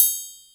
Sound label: Xfade Tri; Yamaha DTXpress; Percussion